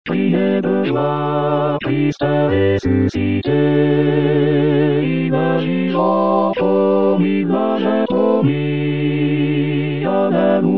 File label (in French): Criez de joie, Chorale